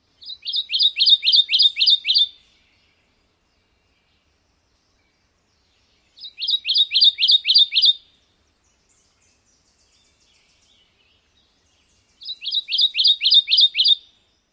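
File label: songs, bird; KY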